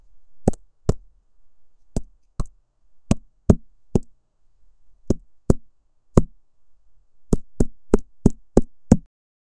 un pico golpeando una sup; textures

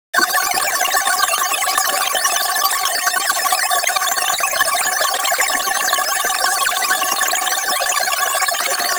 sonido espectral, atmosphere

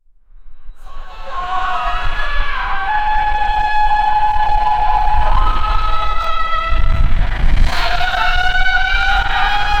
cuando se quedan los juga, buzzer

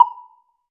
un clic digital muy limpi; fast decay

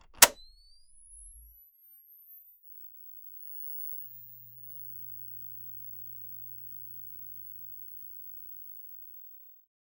un televisor viejo apagan

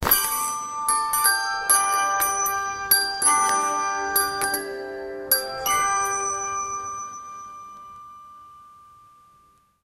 instrumentos tocando musi, instruments

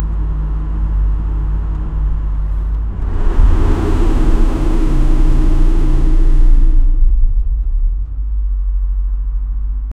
automovil deportivo acele

